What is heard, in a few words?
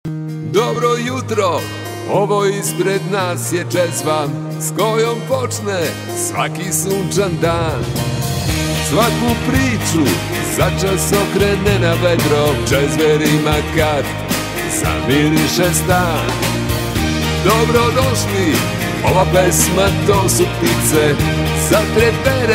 Singing, serbian